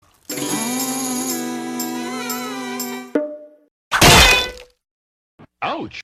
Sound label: guitar smash in the head